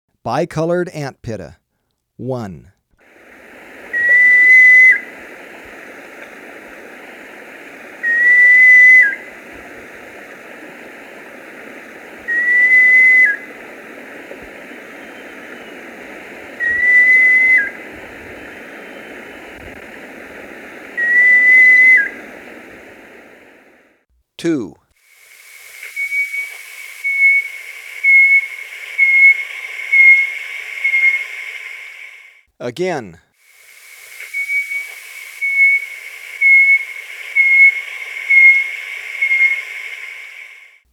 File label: Bicolored Antpitta, Birds of Ecuador; S. America